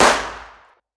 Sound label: fire dist int pistol light; gmod; arccw uc commons attachments